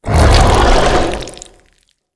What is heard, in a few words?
Limb Loss